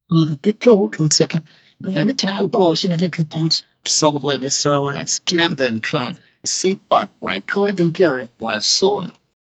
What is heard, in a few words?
a crowd chanting lets go; cheering